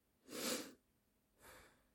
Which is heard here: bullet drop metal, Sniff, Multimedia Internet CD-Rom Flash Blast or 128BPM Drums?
Sniff